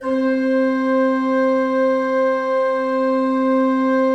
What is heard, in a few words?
C4, Cathedral Organ; ILIO; Propeller Islands